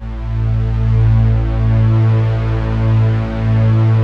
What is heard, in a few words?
PROPHET VS, Vintage Keyboards